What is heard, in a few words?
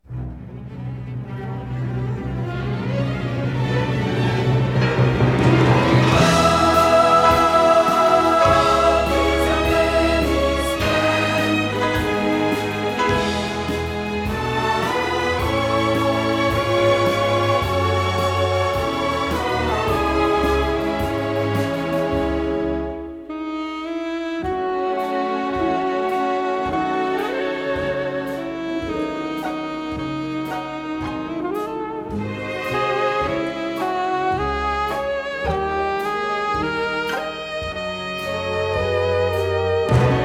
Studio Orchestra - (instrumental); Oldies; Grease Soundtrack